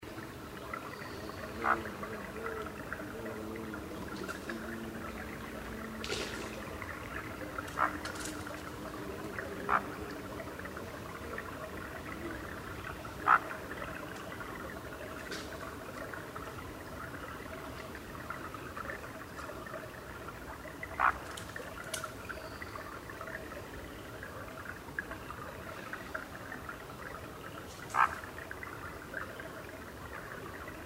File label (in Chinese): 虎鶇; 虎斑地鶇; 鶇科/冬候鳥、留鳥